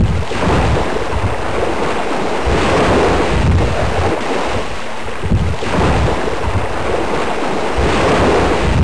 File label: waves; firearms